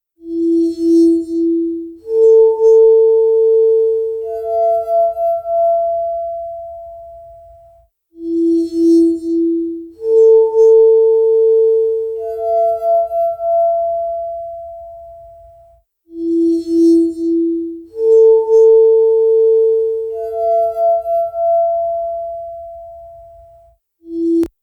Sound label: Glasses